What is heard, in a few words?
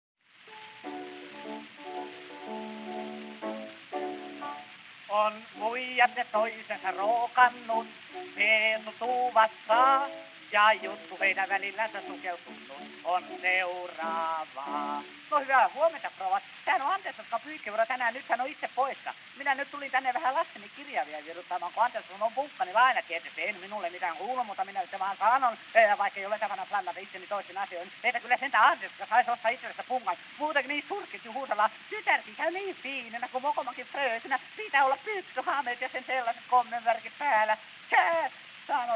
Gramophone